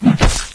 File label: claw strike; zombie; svencoop; custom monsters